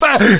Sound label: scientist die headshot